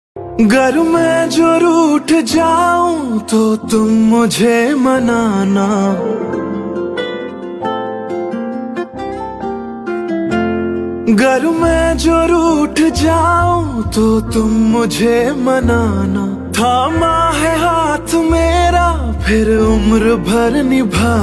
Old Song

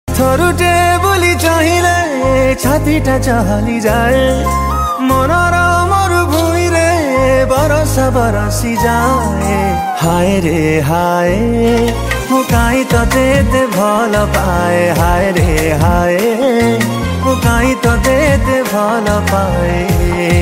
Odia Romantic Ringtone

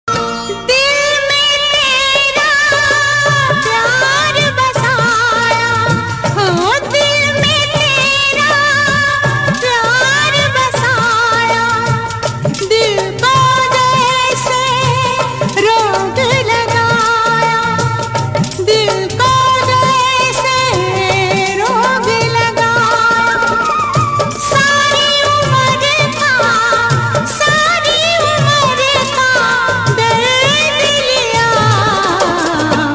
Female

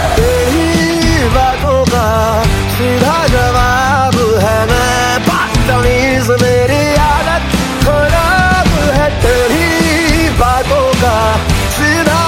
Hindi Song

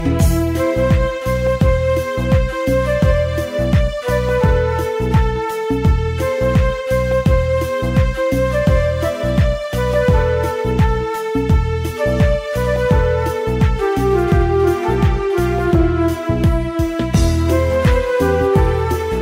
Desh Bhakti Music Ringtone

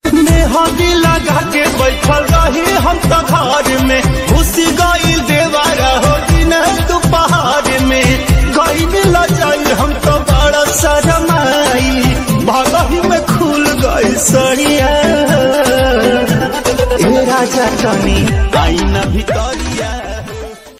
Romantic Bhojpuri Song Ringtone

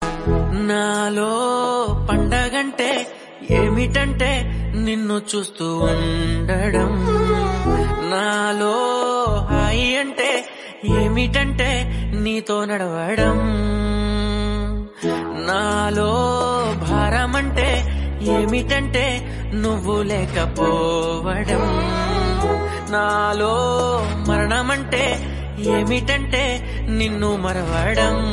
Tamil Song Ringtone

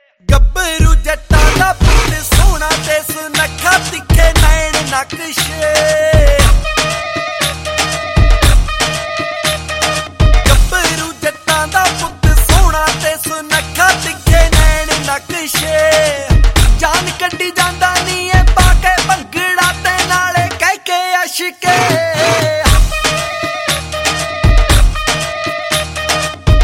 Panjabi Ringtone

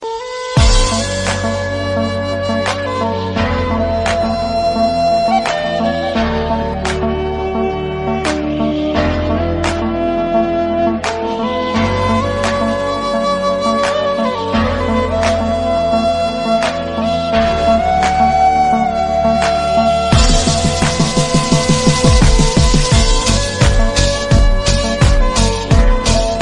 Remix Flute Ringtone

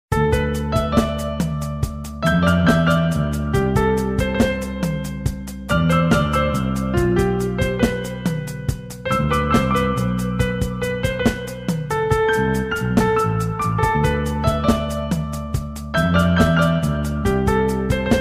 Instrumental Ringtone

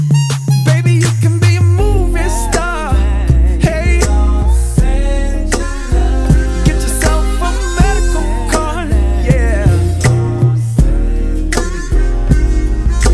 Hollywood Ringtone

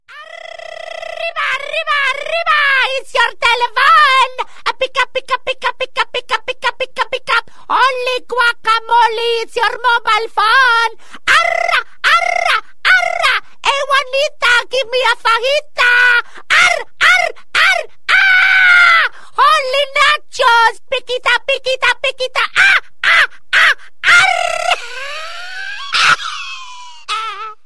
Funny Ringtone; ringtones

